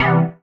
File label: stab chiffy F, Noise; stabs